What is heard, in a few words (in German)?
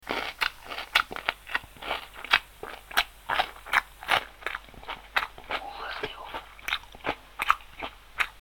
schmatzen